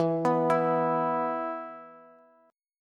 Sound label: E 5th, Bass; arpeggiated; JGuitar